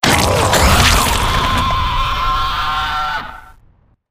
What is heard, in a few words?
boss Die Forest; wild worlds